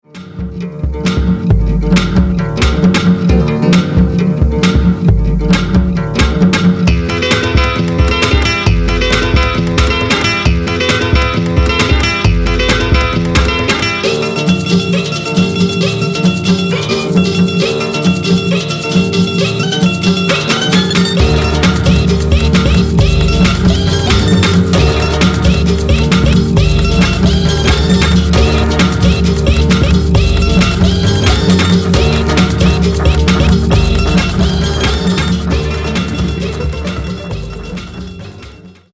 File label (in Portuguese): violao mashup